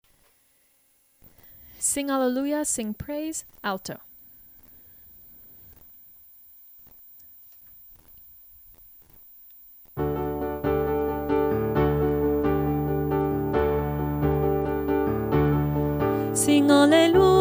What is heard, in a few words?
sing alleluia sing praise alto